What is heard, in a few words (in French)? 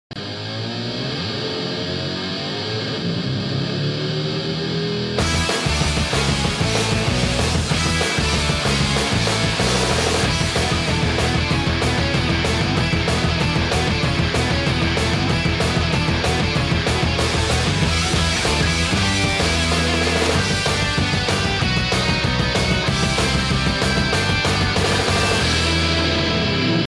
musique generique fin